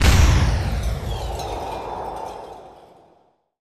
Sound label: Result Bad; Deadstorm Pirates Special Edition; Namco System 357